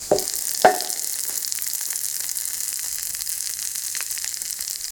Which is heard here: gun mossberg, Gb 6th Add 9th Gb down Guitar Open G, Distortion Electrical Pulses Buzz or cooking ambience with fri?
cooking ambience with fri